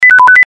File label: Beep